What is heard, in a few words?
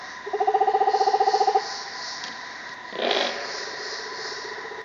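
slow frog duck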